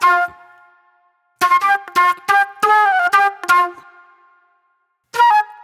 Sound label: Wild Flute